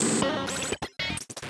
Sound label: glitch, Digital FX; TRUTH-HZ; Crowdsourced Sample Pack